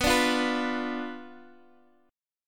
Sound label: B Diminished B Guitar Open G